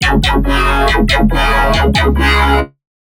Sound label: Melodic Bass F# 140; One-Shots; Bass Hits (Melodic); Fractal; Ghosthack; Color Bass Essentials